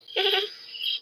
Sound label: cute giggle